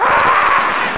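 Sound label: baby screaming; children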